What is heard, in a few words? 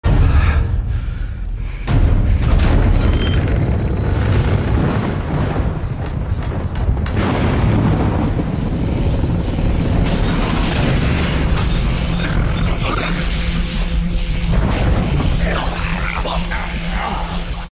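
monster approaching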